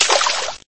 voda myagkii priglushennii

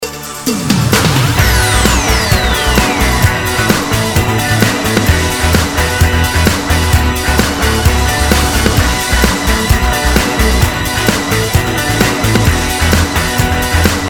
pafosnaya melodiya pobeditelya

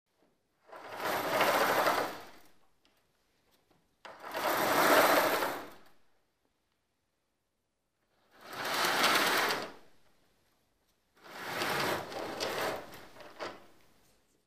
shtori na tyazhyolih metallicheskih trubkah

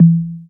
knopka zheskaya blizkii zvonkii

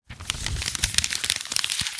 bumaga mnut komkayut

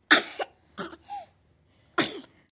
kashel grudnogo rebenka

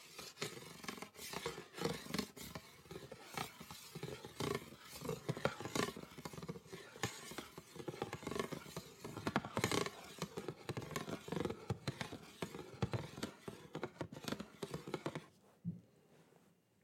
skolzhenie po nerovnomu plastiku

